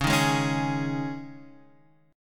C sharp Suspended 2nd, C sharp; C#sus2; strummed; JGuitar

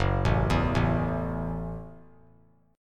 Gb Minor 7th; arpeggiated; JGuitar

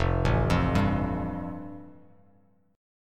F Major Add 9th; Fadd9 arpeggiated; JGuitar

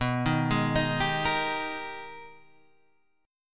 Ab Minor Major 9th

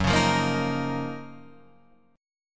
Gb Major 13th Guitar Open F; GbM13 strummed; JGuitar

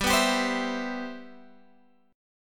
G 6th

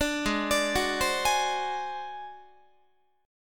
G sharp 7th Flat 5th G sharp down Guitar, chord; G#7b5; arpeggiated

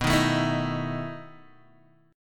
B 11th B Guitar DADGAD, chord

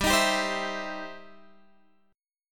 E Minor Major 7th Sharp 5th G

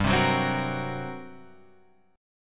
G Minor Major 7th Double Flat 5th down, chord; strummed; JGuitar

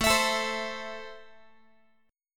A Minor A down Guitar; Am strummed; JGuitar

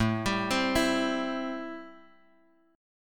A-Minor 7th A Guitar Drop D

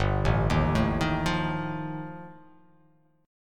G 7th Suspended 2nd Sharp 5th G chord

